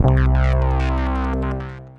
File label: SH Bass; Analog Bass; Ableton; Factory Packs; Retro Synths; Analog Bass Vol 2